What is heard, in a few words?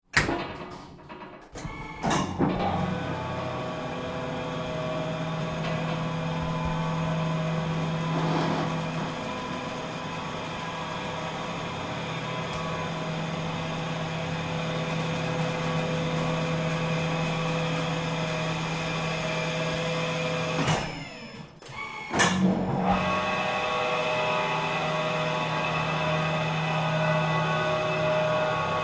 Factory Lift Travel, noisy interior travel with stops and starts; Elevators; Tom Hutchings